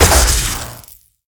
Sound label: Plasmagun Scale, Damage